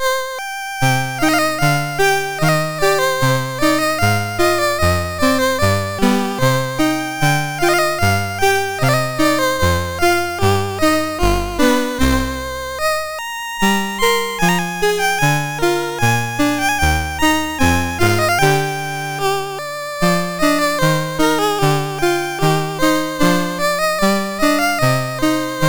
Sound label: gavotte, MOS6581R3; Gavotte B